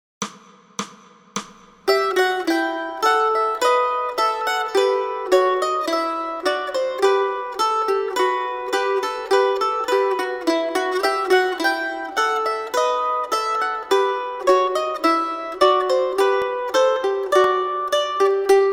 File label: Bourree Mandolin 1 and 2; Bouree in E Minor